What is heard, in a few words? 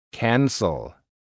cancel